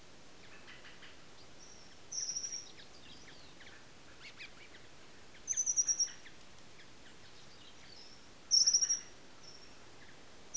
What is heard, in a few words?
Siberian Accentor, nocturnal flight call; LINGSHAN; Beijing nocturnal flight call library